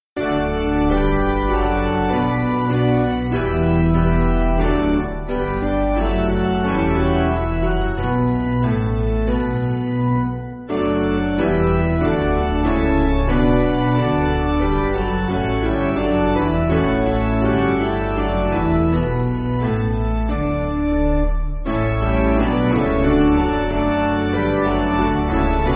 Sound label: Piano, Music Backing; Honour Your Father; Filial Piety